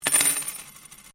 Coin Penny Multiple Drop Laminate

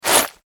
Industry Box Nails Grab Movement; Factories (Sound Effects:Backgrounds)

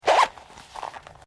POUCH SMALL ZIP CLOSE, small bag; Zippers (Sound Effects:Objects)

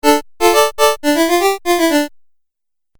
Game Music Phrase; Video Game Sound Effects

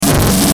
Digital Fast Distortion Blast; glitches; Blastwave

